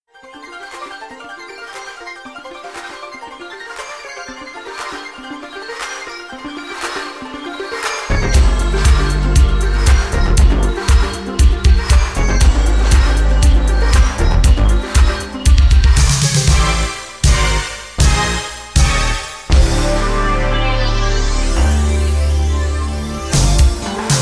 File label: Female J Pop Boogie Funk